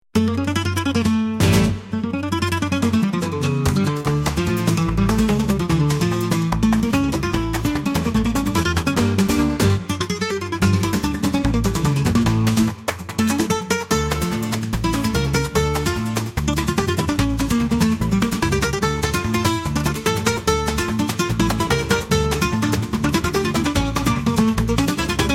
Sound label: sunshine dance, ispanskaya